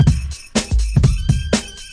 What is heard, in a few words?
124 bigswing squeal hiph